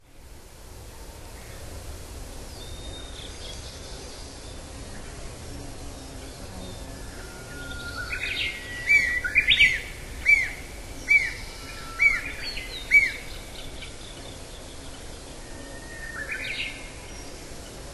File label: nuthatch